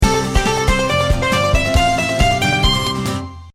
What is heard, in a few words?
BGM end, slot; Brazil; sloteliminate